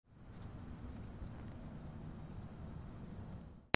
AMBIENCE

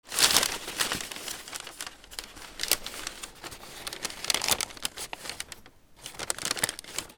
PAPER

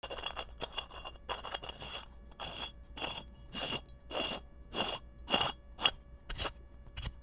HOUSE

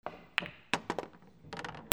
BILLIARD

